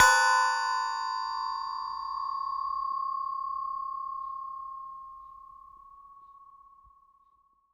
Metal Teapot Stand Strike

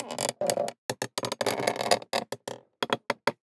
Sailing

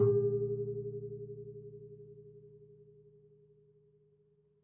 Classical Guitar Marimba Chunks; Classical Guitar Extended Technique Stereo

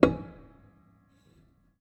Harm High, Piano Harmonics

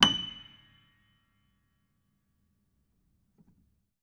Note High, Piano; Piano Miscellaneous

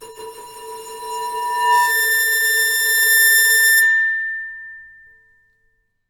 Bowed, Large Wine Glass

